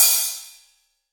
long open hat sound a key; open hi hat

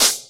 quiet hihat C# key; hits one shots; hat samples; C sharp

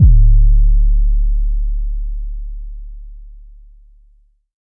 phonk kicks oneshot 808 D; kick drum samples

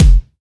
bright bass drum sample f key

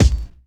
treble rich steel kick drum a sharp key; Duration 0:01; Samplerate 44100 Hz; Channels Mono

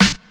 clean rap snare drum f sharp key

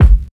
clean bass drum single hit f sharp key

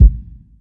00s verby bass drum single hit g sharp key; Duration 0:01; Samplerate 44100 Hz

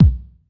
low tone steel kick drum sample b key

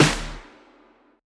long room reverb snare drum sound d sharp key

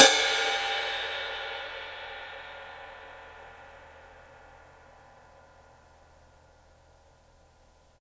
long tail reverb ride cymbal sound clip c key

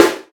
clear steel snare drum sound f key; snare-drum-samples

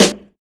raw snare drum g sharp key

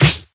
natural steel snare drum D key; snare drum samples

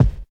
dry steel kick drum g key

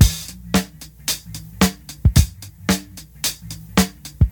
111 bpm drum loop sample a sharp key

